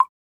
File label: FX Drip; FX Hit; Drums; Ableton Live 12 Lite; Core Library